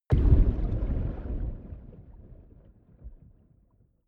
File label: underwater low soft